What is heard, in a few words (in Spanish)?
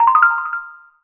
notificacion; mario galaxy; mando